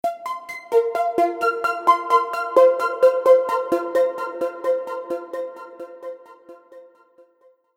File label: Raindrops, ringtones